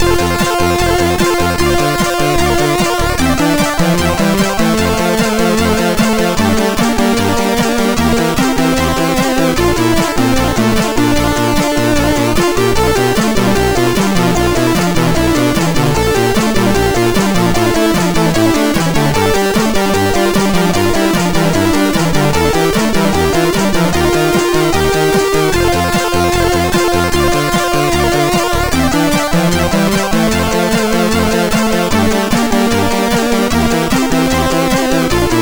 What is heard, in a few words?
D50 tune, MOS6581; MOS6581R2